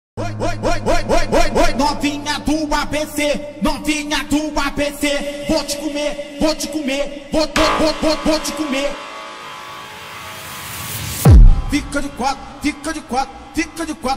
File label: beat phonk automotivo bass boosted; Automotivo 4